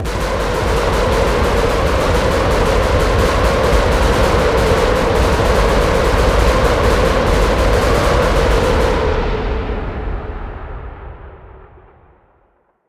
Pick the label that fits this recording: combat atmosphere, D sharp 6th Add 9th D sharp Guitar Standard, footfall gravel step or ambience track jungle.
combat atmosphere